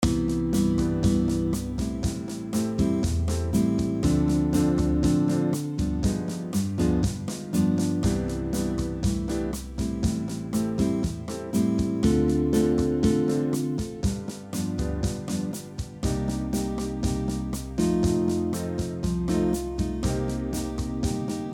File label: bossanova